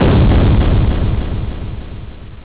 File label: explode; sdlroids-1.3.4